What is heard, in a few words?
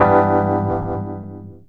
RHODES; E-Lab; X Static Goldmine 2